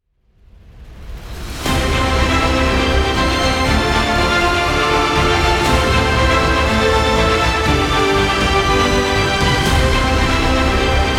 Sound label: epic adventure